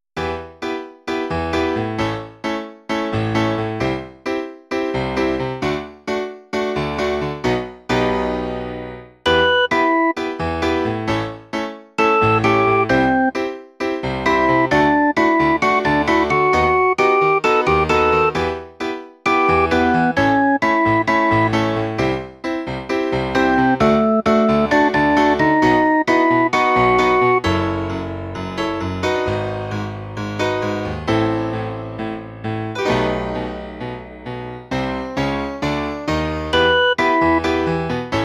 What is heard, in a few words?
midi; sing human rights article04